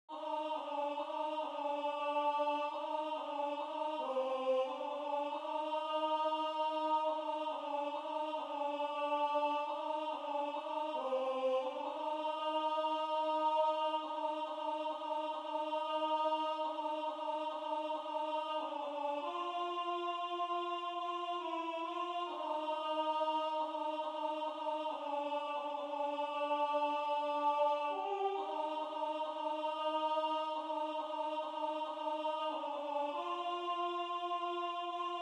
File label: Alto